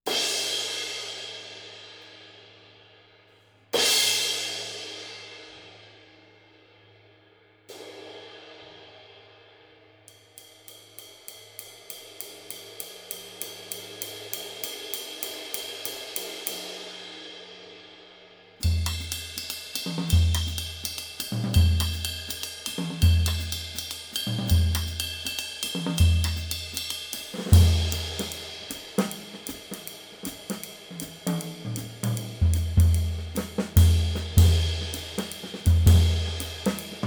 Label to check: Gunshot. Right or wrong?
Wrong